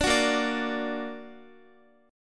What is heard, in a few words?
Bb Augmented Bb Guitar; strummed; JGuitar